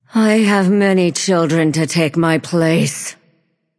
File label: death; smite; arachne